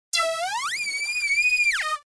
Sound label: Acid shout